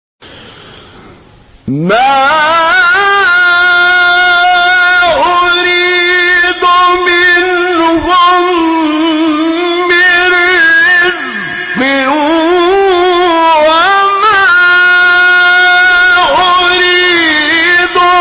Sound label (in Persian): تلاوت زیبای آیه سوره; 57 58; ذاریات; استاد هنداوی